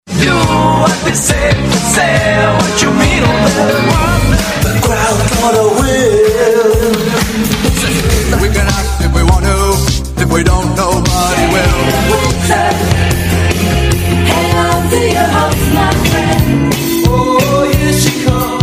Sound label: 80s mix